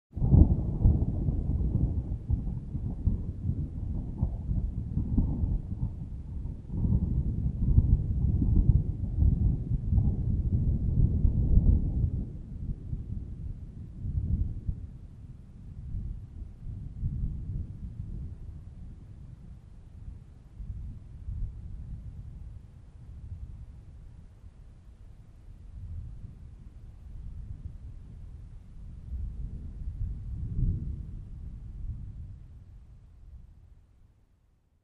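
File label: Thunder Long Crack, distant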